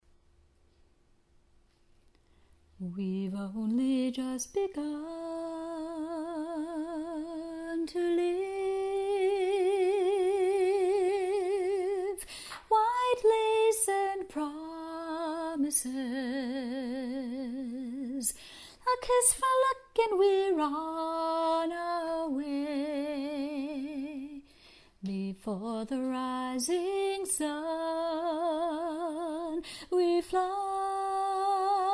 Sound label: A Capella Solo; classical flute